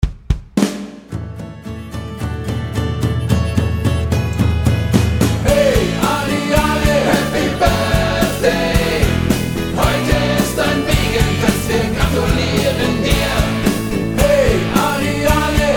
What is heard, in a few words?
Happy Birthday Ariane